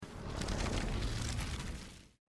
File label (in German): vorspuhlen; life is strange